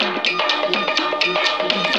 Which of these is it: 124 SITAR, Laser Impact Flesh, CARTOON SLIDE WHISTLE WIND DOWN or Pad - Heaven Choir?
124 SITAR